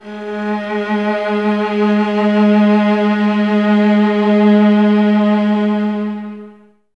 violas G#3, String Ensembles; Miroslav Vitous; VLS 1+2

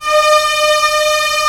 STR A2 FF, orchestra; Sound On Sound; Peter Siedleczek's Orchestra

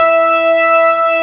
B3 E 4, R; Best Service; Advanced Media Trax Modern Composer; B3 SMALL2

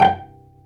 STR VC, Vcs Pz dry; Roland LCDP13 String Sections; STR Vcs Pz.1 dry

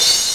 Congratulations!; SNES; Super F1 Hero

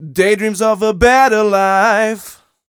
m 127 mel a, Vocals

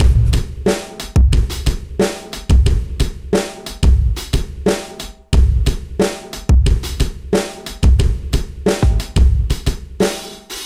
punch up 090bpm; Zero-G; Downbeat Dance Grooves

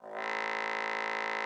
trombone A1; Xsample VOL-3

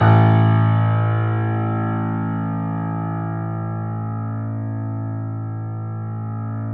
Small Pianos, 3-D Audio Collection

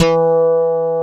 PULL BAS; Synclavier Sampler Library; KEYS AND GUITARS

